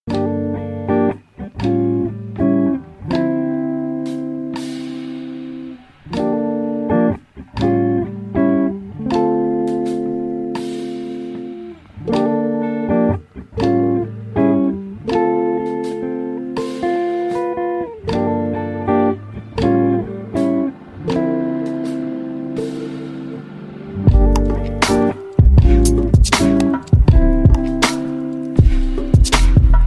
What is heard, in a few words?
chill jazz